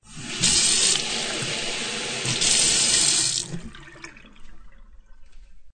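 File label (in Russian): кухня ванная комната открытый кран слив воды мытьё посуды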